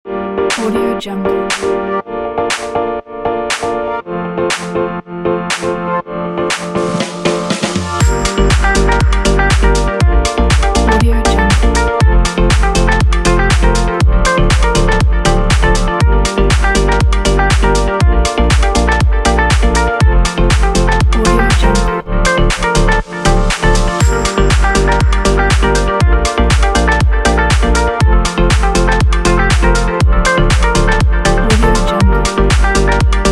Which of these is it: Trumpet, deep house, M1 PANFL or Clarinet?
deep house